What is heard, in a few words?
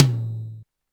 Yamaha RM 50 Toms